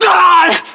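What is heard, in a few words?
death, male; localized english; voiceovers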